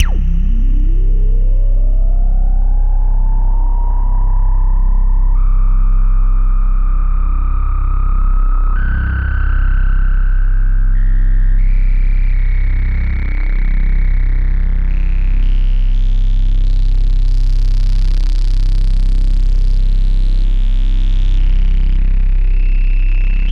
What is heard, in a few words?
Textural Soundscape, IDM; Sonic Foundry (Sony Creative Software); Twine-IDM